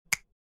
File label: Infographic button